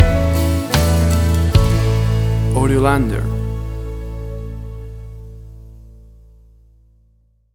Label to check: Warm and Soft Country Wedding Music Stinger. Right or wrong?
Right